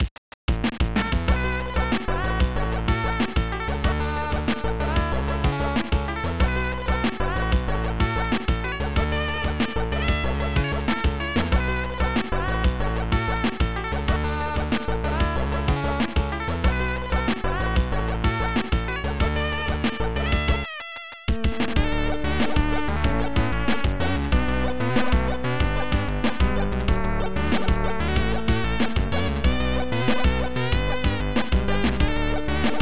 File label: keygen song